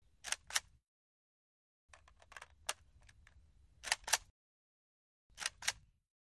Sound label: AK47 Load Cup Chamber